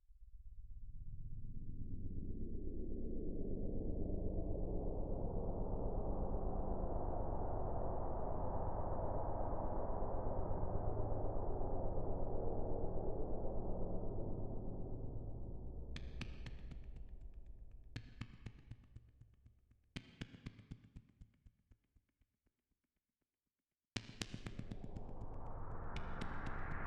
ambient noise; gamerip; The Salesman; Salesman ambient noise 1

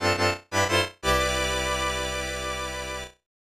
Bonus Game Win - Battle Game Win; SMB3; Super Mario All-Stars; SNES

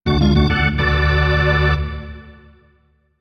Baseball Homerun; Wii Sports Club

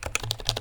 UI Comment Type

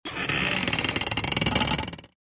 Chair; mahouteq

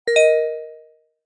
Notif

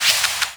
SFX - Draw Two Opponents; uno

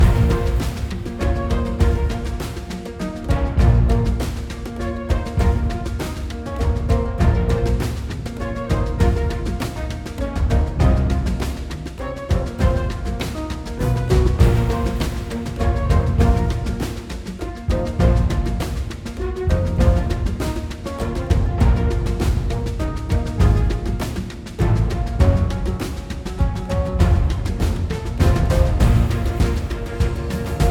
Intensity 100bpm; Biome 4 Intensity 02

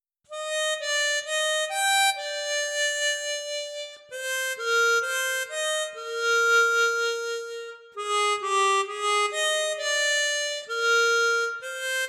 Harmonica short Ver.; Trails in the Sky 1st Chapter

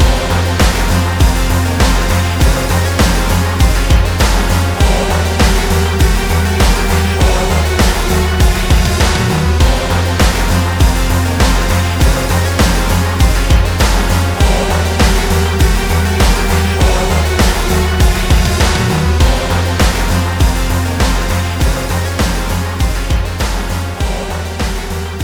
Burning-Hot Beats

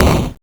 Punch; mahouteq

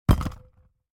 Place Fruit

